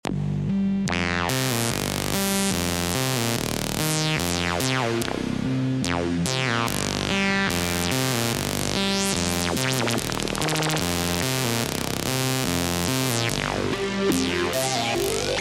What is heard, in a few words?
acid, goa psytrance serum2 presets; Vortexion; JLS Fractalis